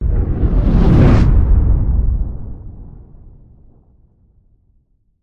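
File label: energy strike force field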